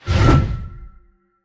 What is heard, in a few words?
swing; smite; atlas